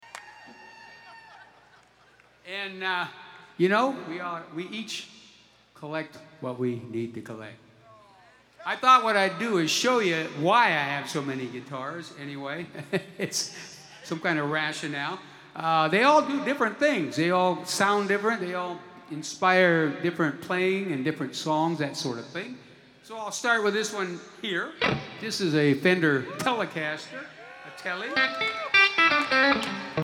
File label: Guitar Moment